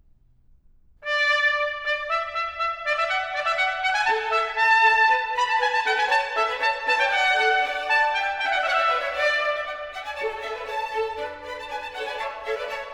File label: Sonata for trumpet, strings & continuo, Allegro